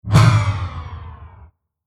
Spin Down Stinger Impact Power Down Digital Robot Scifi; Sound Design; Tech Sound Effects